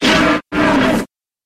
monster cyclops

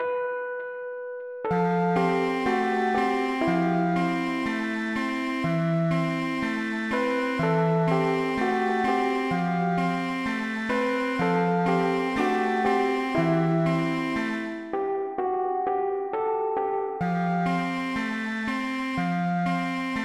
misc creepy, music

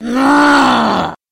monster cursed assassin

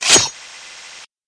hit sword

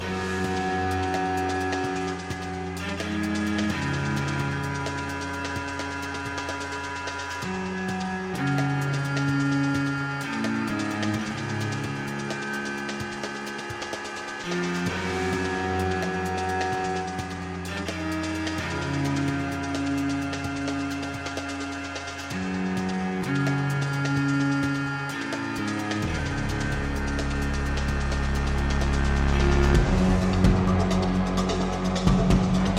wilderness intense hell, music

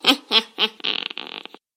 monster harpy

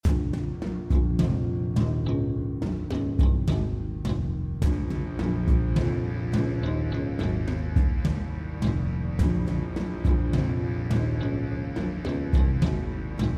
town orc, music; ornarl

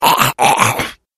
monster goblin

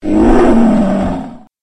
monster bear

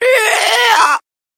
monster lizard man

